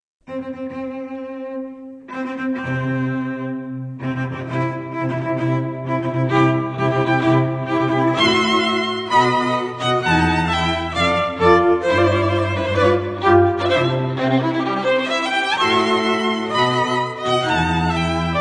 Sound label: festiva strings